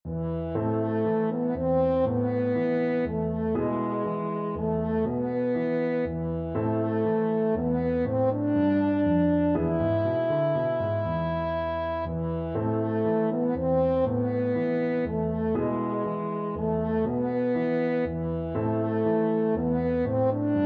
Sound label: Hearts Ease, french horn